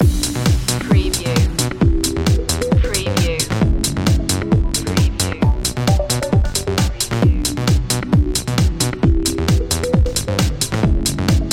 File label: funky flipper